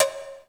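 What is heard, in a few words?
POWER KITS, cowbell; 90 sSampleCDs; Crypton - DrumBase5000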